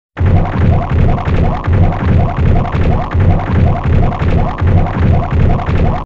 pooping sound effects

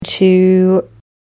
trill

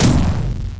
fire; metal sonic

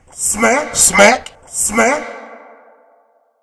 smack x3

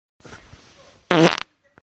authentic fart

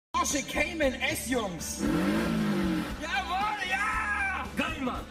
porsche cayman s

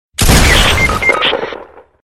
shotgun; fortnite

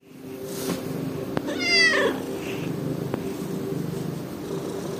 meow; floki flokers

